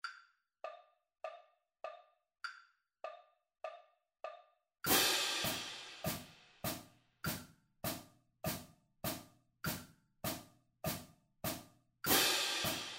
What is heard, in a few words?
Triplet Grid 100 bpm Cymbals